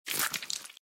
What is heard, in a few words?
bloody hit; sorcery